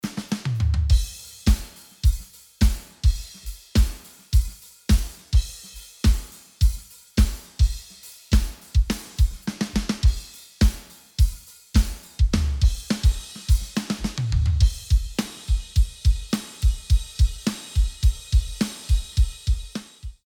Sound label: Drums; tubetech; pultec shootout